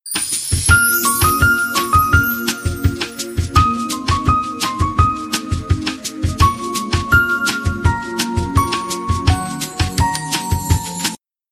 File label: sms